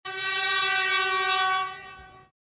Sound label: Air Horn